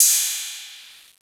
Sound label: cymout